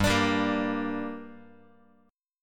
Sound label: G Minor G down Guitar, chord; strummed; JGuitar